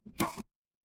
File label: ui modal close